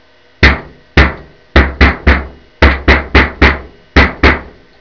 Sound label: drum; M25 on the way to the Wingate & Finchley away match